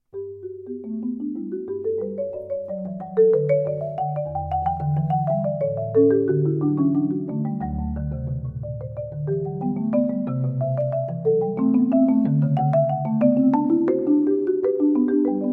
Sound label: marimba